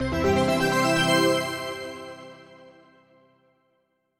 jackpot Intro

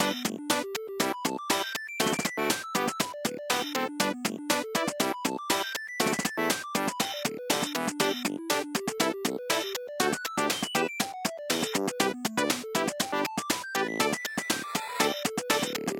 brass; retro bowl

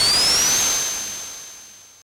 dash

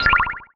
menu Enter

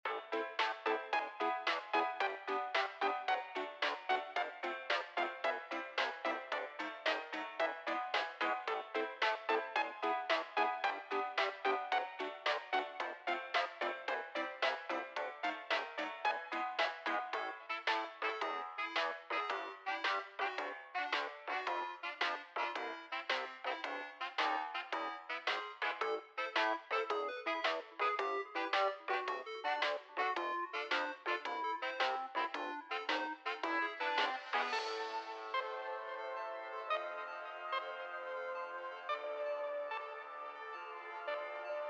Main Theme Radio Version LOOP; Death by AI

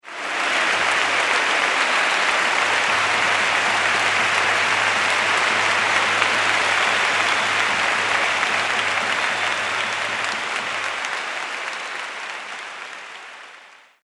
applause long; stadium

